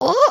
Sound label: lunge grunt; Valiant Knight; emotes